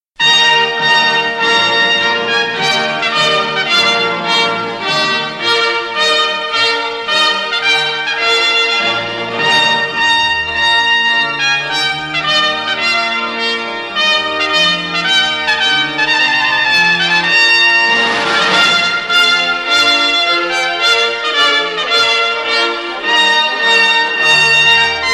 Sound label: TUNE AND AIR FOR TRUMPET AND ORCHESTRA